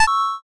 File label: saved